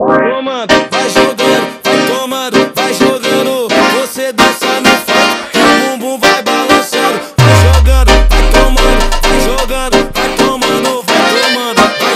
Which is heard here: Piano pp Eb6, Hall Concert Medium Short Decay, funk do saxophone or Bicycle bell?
funk do saxophone